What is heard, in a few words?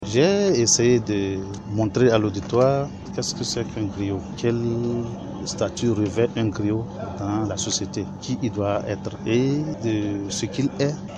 KOTO; GRIOT